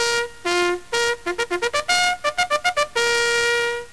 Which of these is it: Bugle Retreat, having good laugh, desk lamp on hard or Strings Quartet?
Bugle Retreat